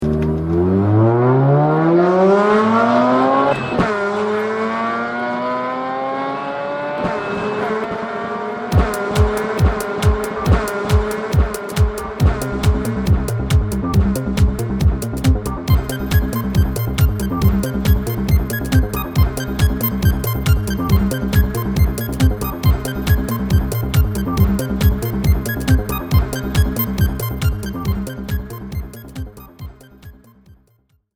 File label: Rotary Mix